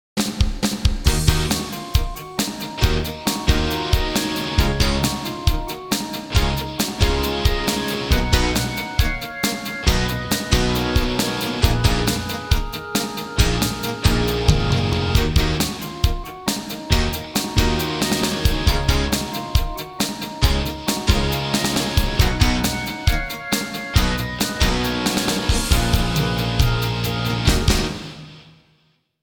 片头音乐

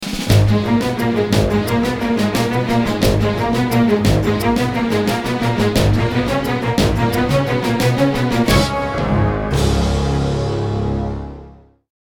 电视包装音乐

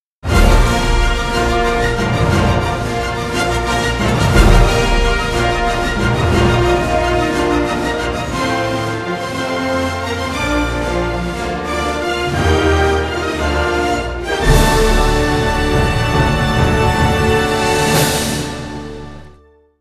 片头音乐

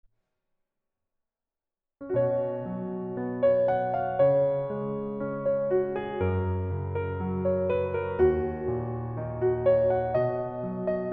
微电影背景音乐

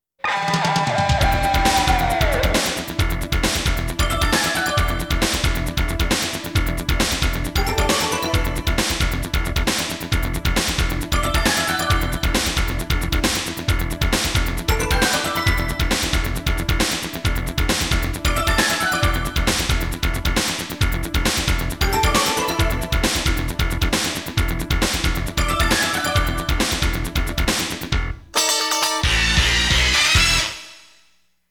激情的背景音乐

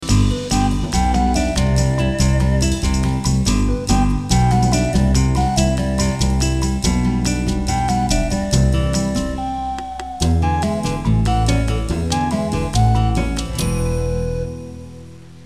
片头音乐

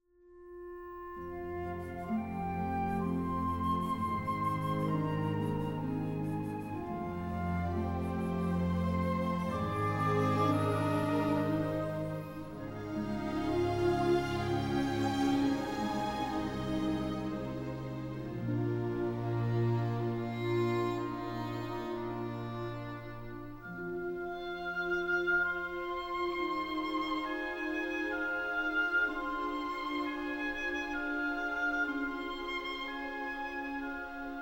豪迈的背景音乐; 3分以内

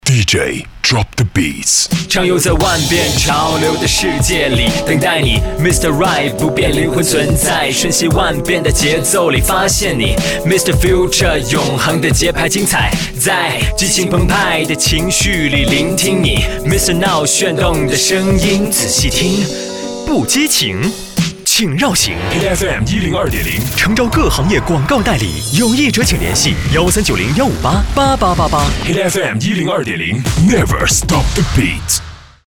男国 歌曲翻唱 说唱 广告; HITFM南京广告代理

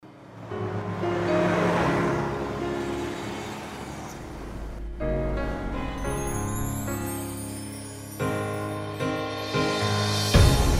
专题片背景音乐

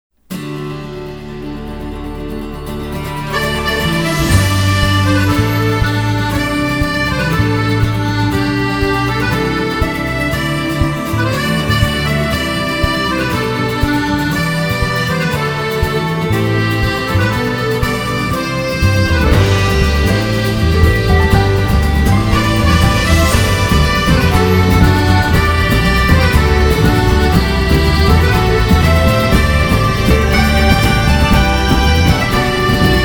欢快的背景音乐

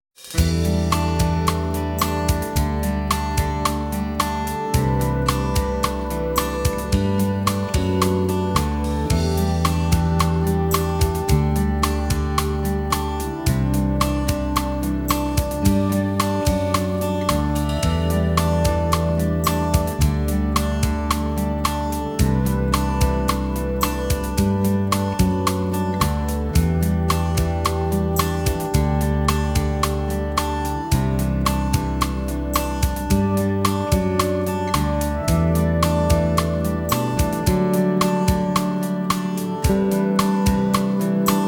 纪录片背景音乐

